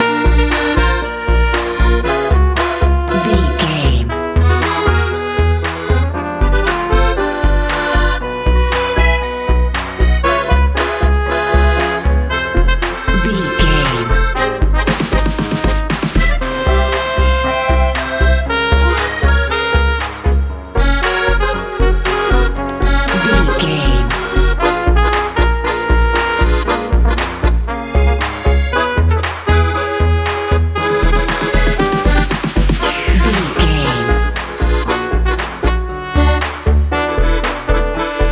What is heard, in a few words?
classic dance